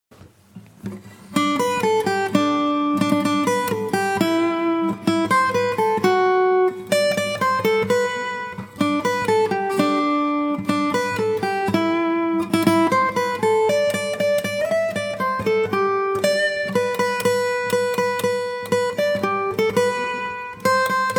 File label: Jingle Bells, guitar